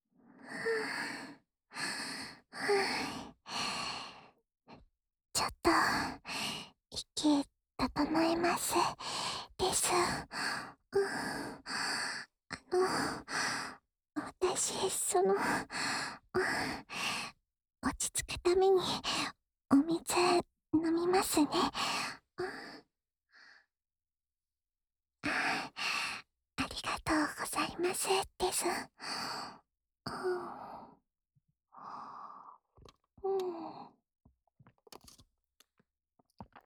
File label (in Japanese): アドリブ耳舐め（右耳）, ASMR; まいてつ Last Run!!